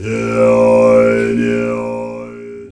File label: TIBET; Optical Media International; Sonic Images Library; SI FX Vol 5